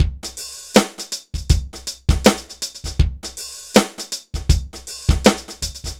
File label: dry Loop BPM 80 Dry, AKAI S6000